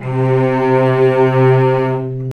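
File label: cellos attack C3 R, String; arco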